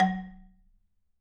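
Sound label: F#, Marimba dead note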